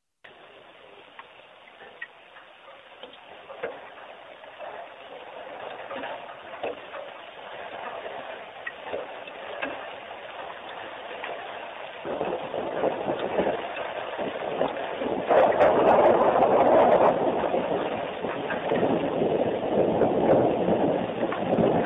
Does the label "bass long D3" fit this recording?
No